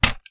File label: Paper Smack